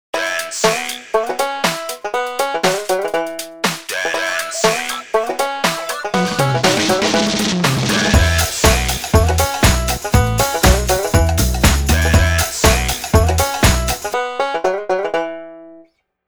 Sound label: ULTRA FOLK DANCE